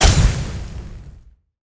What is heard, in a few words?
archer light arrow hit